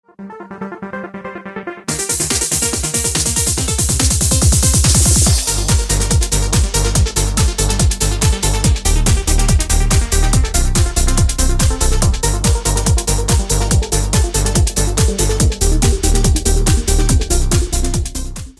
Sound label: Pulsatic Trance